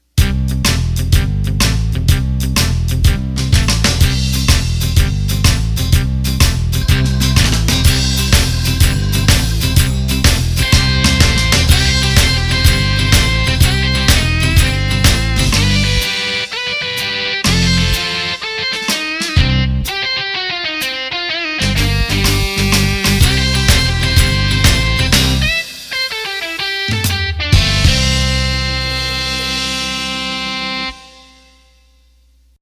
Baby Rock N'; roland bk7m

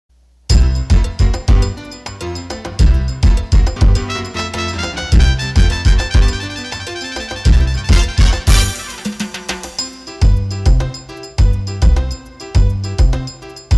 Salsa

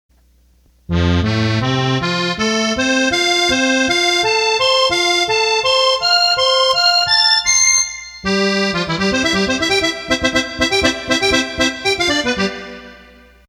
Accordion

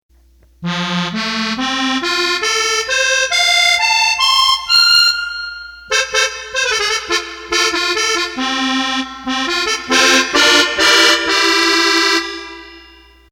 Accordion Musette

